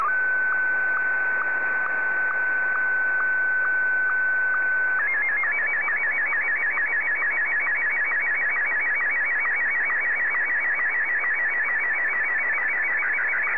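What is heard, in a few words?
SSTV, Martin 1; ZL2TJM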